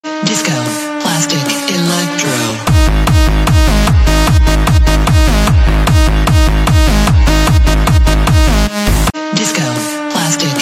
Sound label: Acid Disco